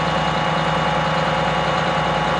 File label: diesel, machines; ambient; Counter Strike Model Pack; CS:GO